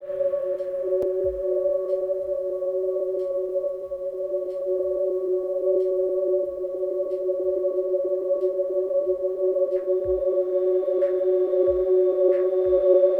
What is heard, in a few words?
ambiance; B-Film; live